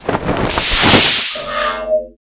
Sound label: Harpy Thunder Wide; Seer; GamigoNA